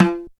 sharp tom drum single hit f key, Royality free tom drum single hit tuned to the F note; tom drum samples